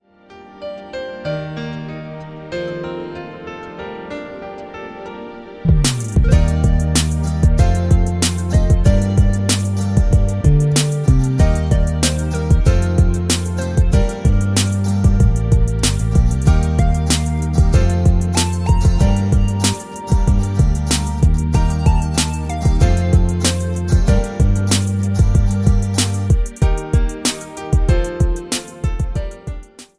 Popular Japanese rhythm atmosphere; MIUI14

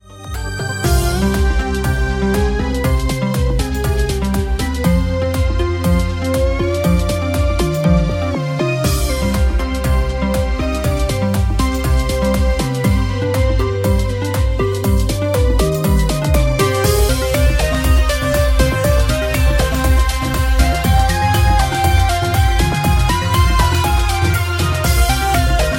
Rock Party; MIUI12

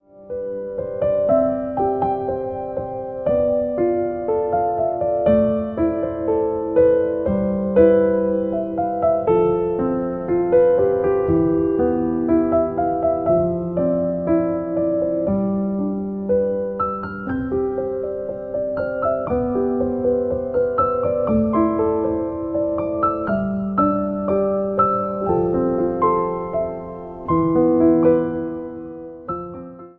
Misty Piano; RINGTONE; MIUI12

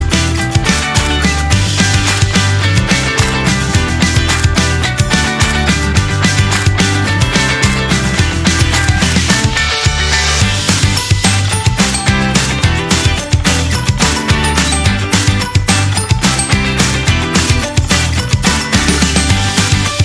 Upbeat Happy Pop; MIUI12

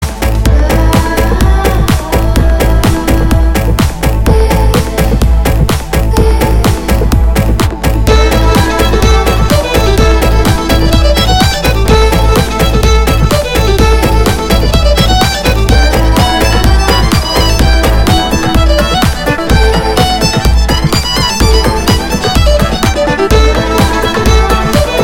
Celtic Air; MIUI12

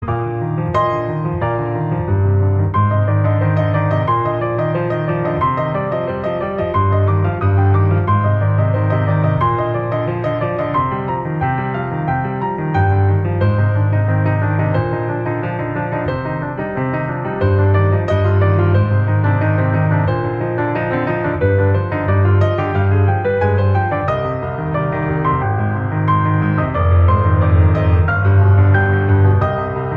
Solo Piano; The Judgement; MIUI12

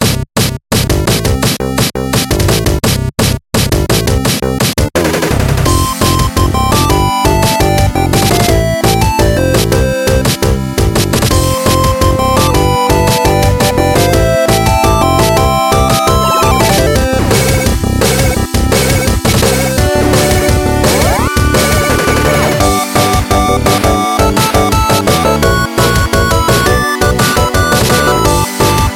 Classic Arcade Music; MIUI12

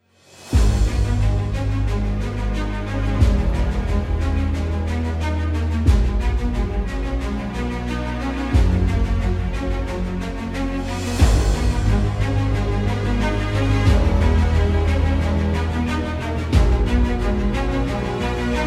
background dramatic epic; RINGTONE; MIUI12

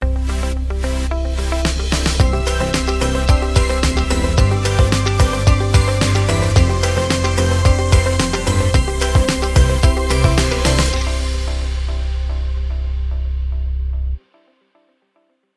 Uplifting Summer Synth

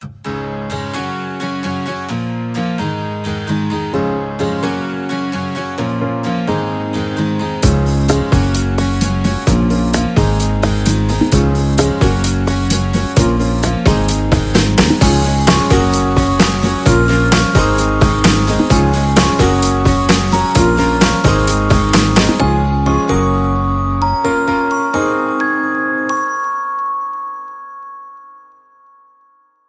Positive Uplifting Rock; MIUI12

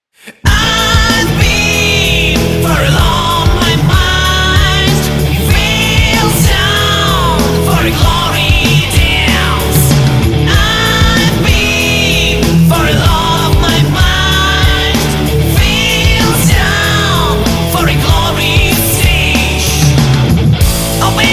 Hard Rock, ringtone; MIUI12

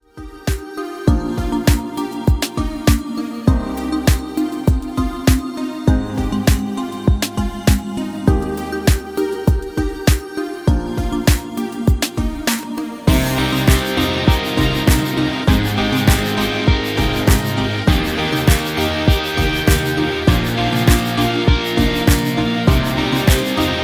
Slow Electronic Rhythm; MIUI12

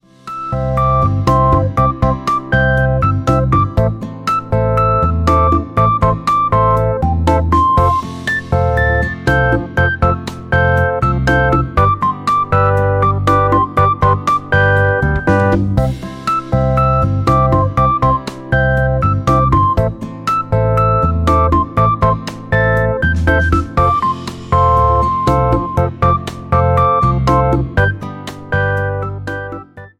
Happy Rhythm, ringtone; MIUI12

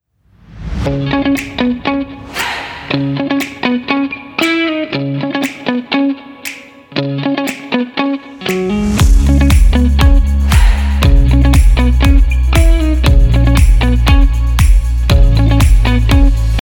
funk it up; MIUI14 and HyperOS 1.0

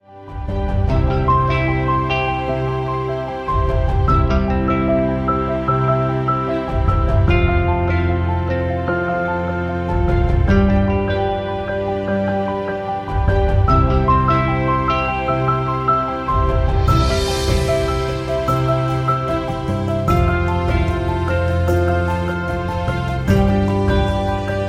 Active rhythm; MIUI12

